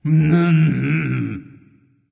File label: tongue; smite